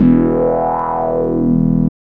SYN TON

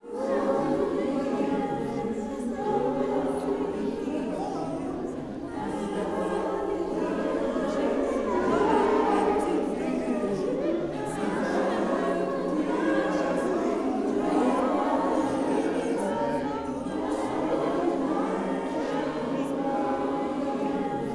SPIRIT SONG, Vocal Planet Gospel; SPIRIT SNG

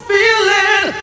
VOX; ZERO-G; Datafile

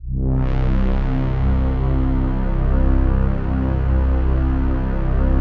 PAD, ATMO PADS; Best Service; XX Large

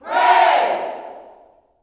PRAISE, YELL SHOUT, Gospel; Spectrasonics; Vocal Planet Vol.1 Gospel; akai

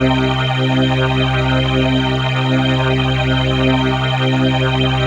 PHASE PAD, ATMO PADS; Best Service; XX Large

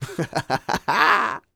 LAUGH, Vocal Jazz Fx

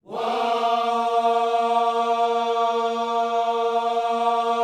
WHOA A#3, Vocal Planet Gospel; Spectrasonics; Vocal Planet Vol.1 Gospel; akai

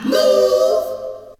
VOCS

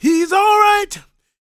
GOSP MALE, Vocal Planet Gospel

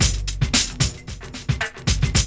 LOOP; E-Lab; X Static Goldmine 2

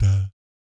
DAT G2, Vocal Planet Jazz Fx, JAZZ COMBO; Spectrasonics; Vocal Planet Vol.3 Jazz Fx

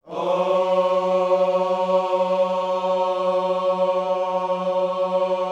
OHS F#3B, gospel vocal; Spectrasonics; Vocal Planet Vol.1 Gospel; akai

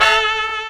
HORNHIT, HORN STAB; E-Lab; X Static Goldmine 2; akai

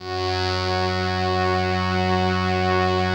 Atmo Pads; Best Service; XX Large

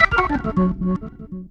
ORG RIF; E-Lab; X Static Goldmine 2; akai

